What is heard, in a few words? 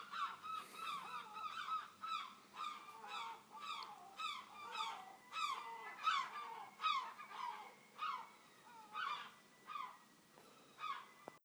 Lyme Gulls